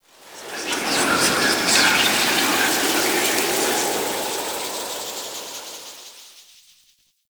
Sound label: Ancestor Tree Wind; Midrath Island; Fey Hub